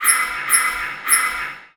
lock release; room 1

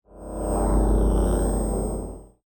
Hologram Landscape

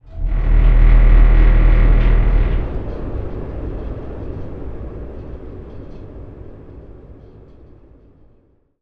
room fx ventilation loop metal rumble; Ambient

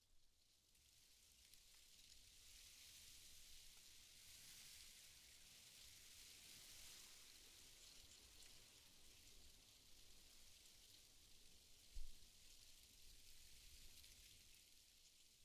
leaves, MidDay; FontainebleauDemo

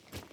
Movement Clothes; DOTSSample

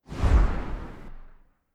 Fly By beefed low; Megacity

